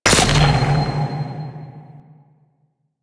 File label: Fishing Fish Impact; Duviri Maw Fishing